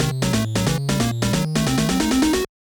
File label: Hurry Up 8 Bit